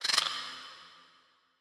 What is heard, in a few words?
night birds; factorio